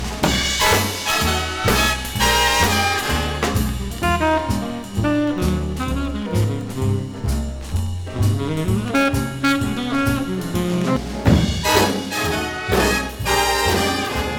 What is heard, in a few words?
Late Sax Mono; BBC